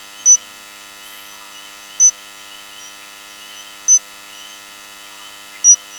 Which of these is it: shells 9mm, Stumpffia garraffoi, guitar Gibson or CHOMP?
Stumpffia garraffoi